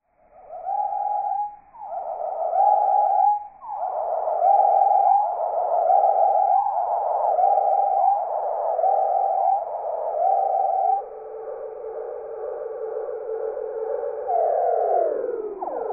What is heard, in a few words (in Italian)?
fringuello; 2-fringuello; I 7 CANTI